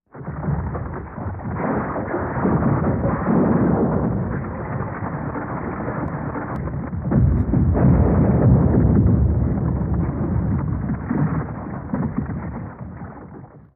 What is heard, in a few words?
Avalanche, Rumble, Cracking Ground, Huge Rocks; Avalanche 2